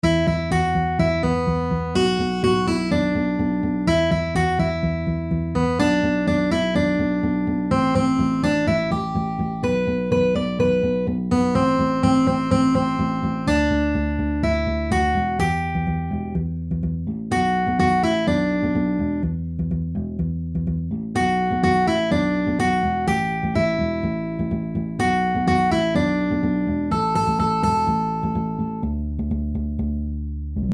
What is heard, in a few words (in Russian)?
melodija